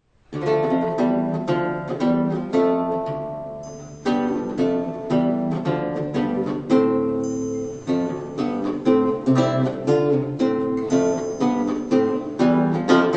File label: cornamusa loutna; tar; mater santsmi